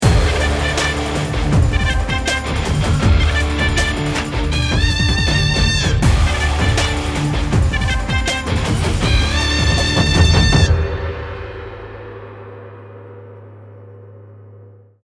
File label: round ten sec count, music